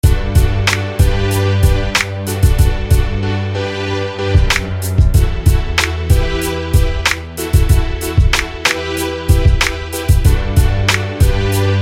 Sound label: Aura Strings; SFB FX